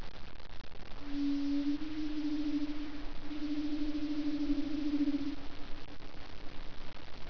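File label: Leopard seal underwater FM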